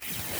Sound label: Road Walking; leopard iPhone